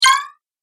Quick Little Spell, Magic Sound Effects

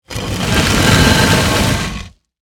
Crypt Door Open, Rock, Movement; Horror; Monsters; BlastWave

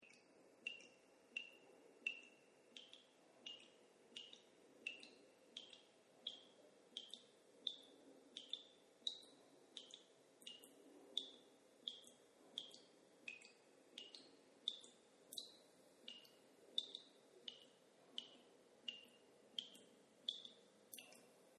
Bathtub Drip Loop; Bathroom; Household

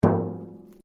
RUBBER ON METAL HOLLOW; Metal Sound Effects; Materials

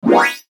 CHIME CARTOON, Chime Cartoon Ascend Musical; Production Element Imaging Element Accent Transition; Multimedia; Chimes; Blastwave

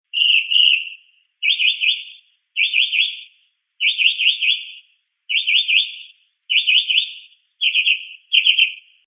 Mocking Bird Call, A single mockingbird call.; Birds; Animals

